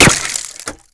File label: impact wood; Modern Warfare